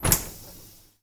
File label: Lift Door Close; Game rip; MiSide